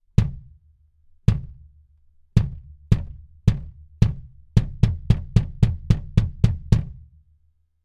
bass drum, dynamic flat; lewitt; dtp640 rex

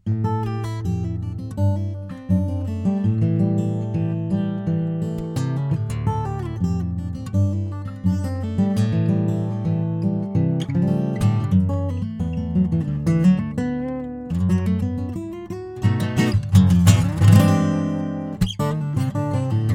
acoustic guitar performance lct340; lewitt

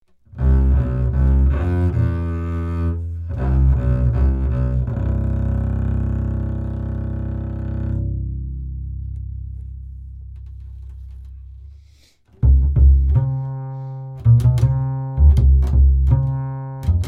upright bass mtp940 cm; lewitt

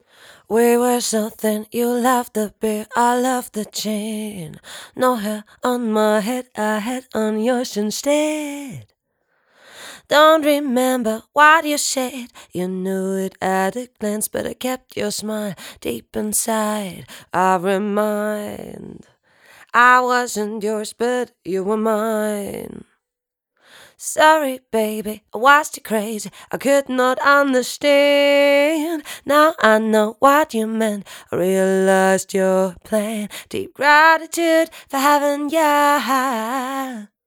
fem vox omni

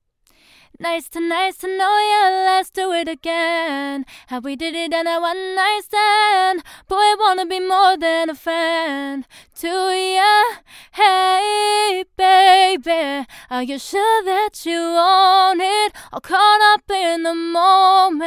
female vocals performance, tube; lewitt

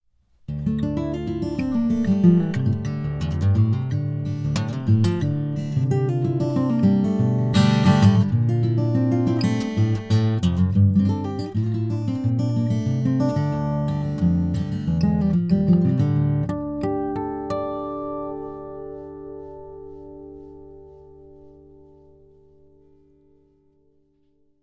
acoustic guitar mtp940cm; lewitt

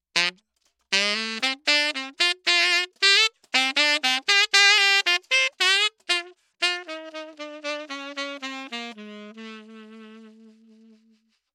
saxophone; lewitt; mtp840 dm no 48v

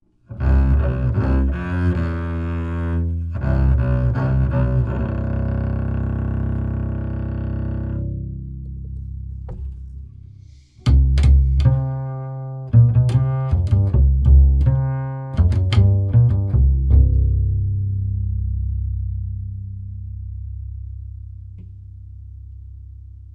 upright bass dtp640 rex condenser dynamic; lewitt

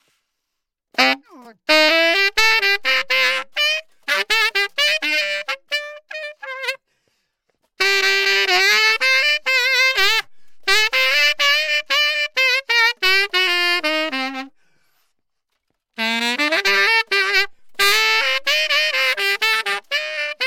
saxophone performance; lewitt; lct940 tube

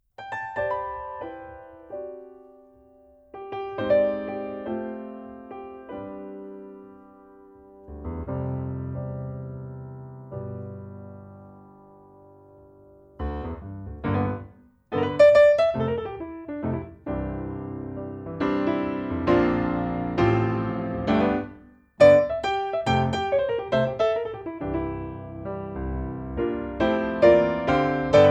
piano, omni; lct 441 flex; lewitt

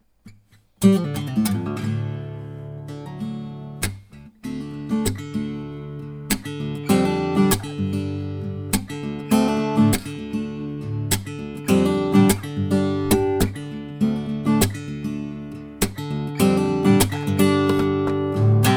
air guitar; lewitt

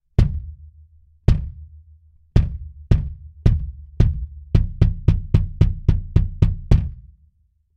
bass drum dtp340 rex; lewitt

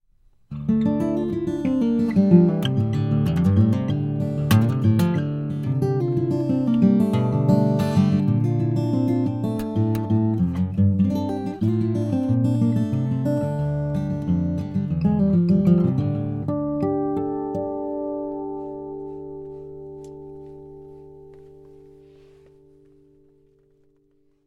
acoustic guitar lct940 tube; lewitt

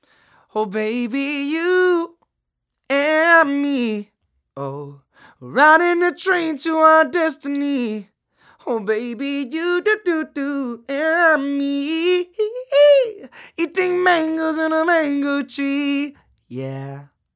lct 540s male vocals; lewitt

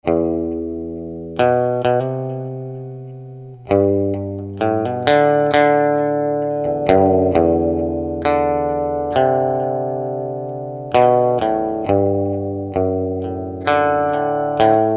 electric guitar, eight; lct 640 ts; lewitt

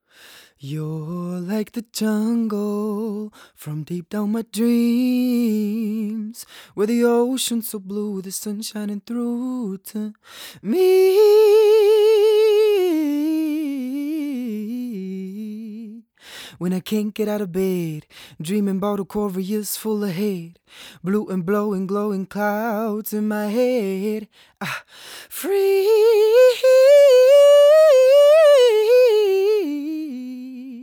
lct540s male vox

